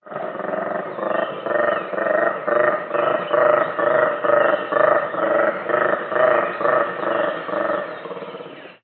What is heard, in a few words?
black and white colobus; sounds of the wild